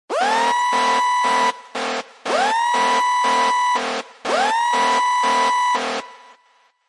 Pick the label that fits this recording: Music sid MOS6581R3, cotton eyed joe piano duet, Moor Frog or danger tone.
danger tone